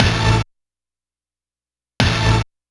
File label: 8bit Hit; Sonic Foundry (Sony Creative Software); Dr. Fink's Funk Factory; Orchestral Hits